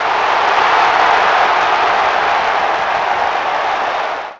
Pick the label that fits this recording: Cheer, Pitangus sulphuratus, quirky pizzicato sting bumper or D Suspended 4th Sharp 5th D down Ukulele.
Cheer